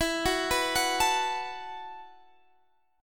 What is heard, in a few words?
B 7th Suspended 4th E